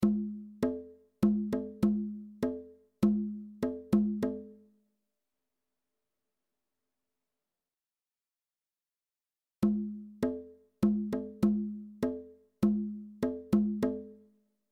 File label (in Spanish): bongos; reciclar tocar